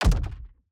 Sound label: environment block destruction kick